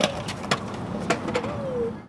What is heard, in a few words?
Skate Park Skateboard, percussion; HOUSTON; Jamail